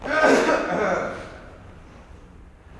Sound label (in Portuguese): tosse